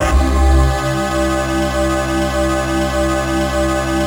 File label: synth clav; Best Service ProSamples vol.55 - Retro Sampler; AKAI